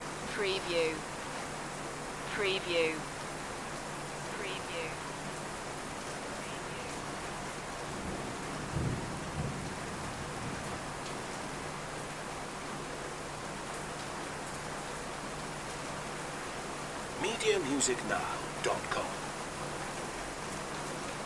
thunder and rain

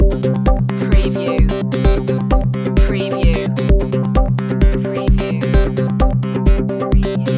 loop, chill

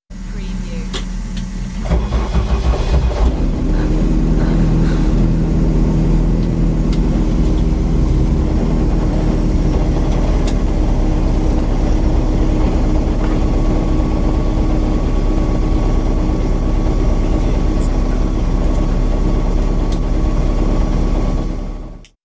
Rally car starting; beanstalk audio

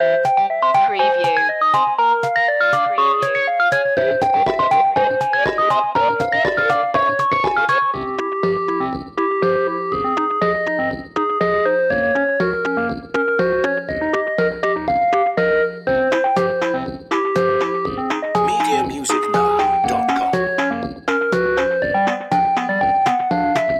8-bit boss loop

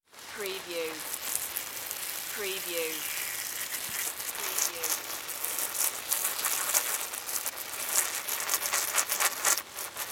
Pesticide Spray Narrow

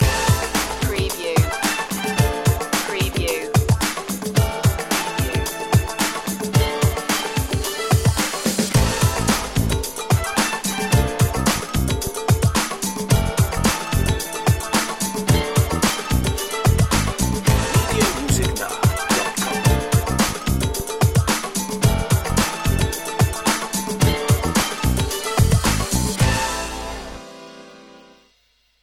funk

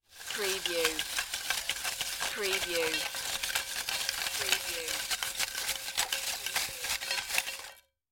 Paper Spinning in Metal Pot (Ticket Cage)

